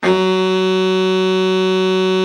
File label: BARITON, Sax; Giga Samples Collection